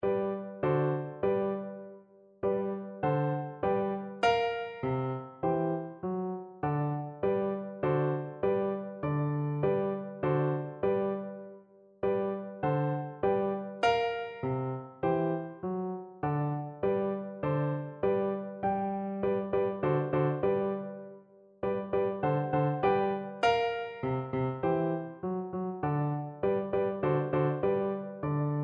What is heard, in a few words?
hot cross buns FL kar, Flute